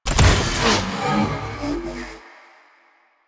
Bullet Jump, Motorcycle; Motorcycle Ninety Nine

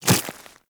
Small Walk Dirt, Footsteps; Warframe

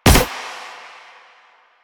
Mech; Duviri; Incarnon Adapters; Incarnon Sicarus Prime; Weapons

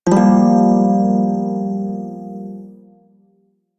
Prime Shawzin Phrygian Chord J; Music; Warframe

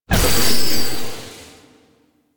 Glyph Prism Blind; Warframe; Duviri; Glyph Puzzle

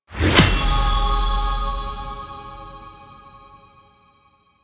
UI Sockets Complete; DE; Warframe; Fusex Treasures

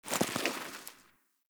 organic pickup; Objects; Pickups

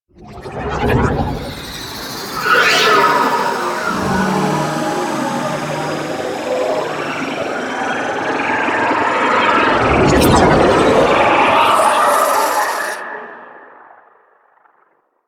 Insect Liset Extraction Space, Vehicles Flight

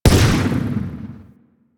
Swarm Missile Explosion

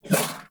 Wyld Blind Shot; Powers; Fey; Saboteur

